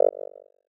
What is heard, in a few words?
Countdown 123 Beep